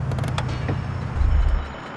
stop, vehicles; premier

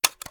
ar reload fast mag out; weapons; gmod; viper; scharlie

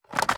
ar akilo47 reload mag in; weapons; viper

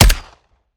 sup plr; weapons; gmod; viper; scharlie

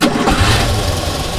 v8 start loop; commoncar

